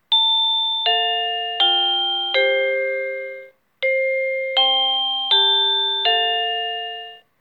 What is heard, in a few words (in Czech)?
melodie